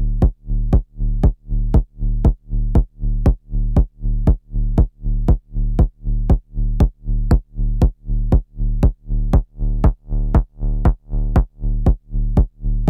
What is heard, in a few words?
Ducking